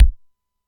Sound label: BD, Simmons SDS-9; drum machine; Factory C4